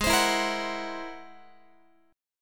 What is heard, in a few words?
Ab Augmented 9th, Guitar; strummed; Ab+9; JGuitar